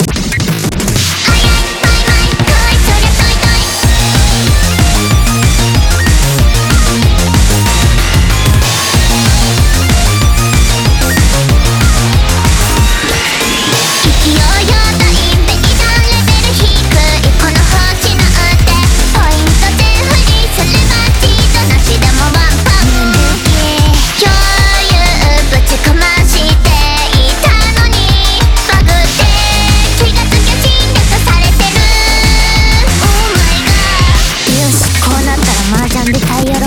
BGM Reach

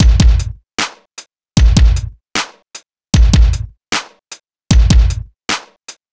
Ditty; NBA Inside Drive 2003; Xbox

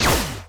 Enemy Attack Shot; disney star smash android ios mobile gamerip 2020

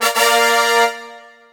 Unknown Fanfare; Shin Megami Tensei Devil Summoner Soul Hackers; 3DS

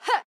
Swipe; Going Under; Jackie

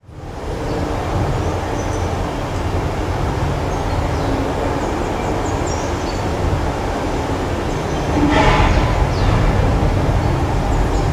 Park; Cities

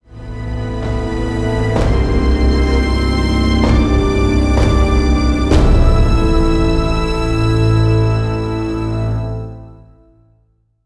music village assassination

